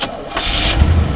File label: START IDLE; AKAI S-Series CD-ROM Sound Library VOL-8; SFX